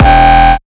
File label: kick oneshot monster E; GhostHack